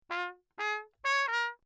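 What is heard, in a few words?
trumpet mute Lechner phrase; Perinétventiltrompete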